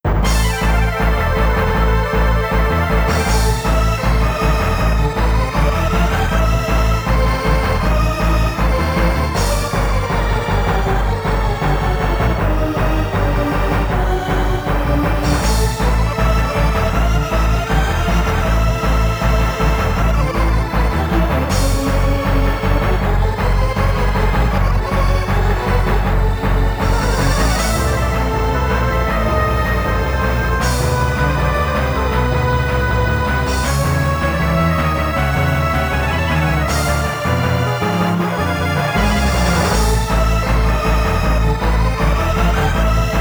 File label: Boss Battle; Ogre